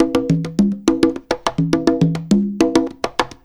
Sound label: Perc, Percussion Latin